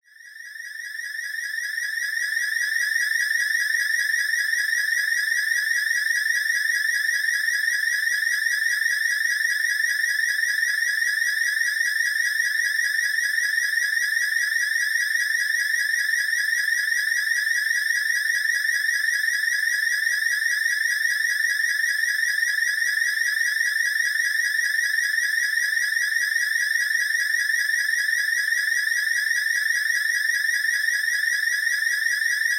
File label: alarm